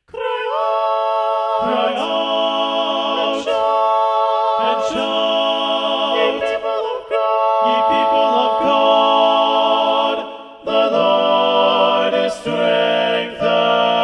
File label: cry out and shout, balanced voices; knut nystedt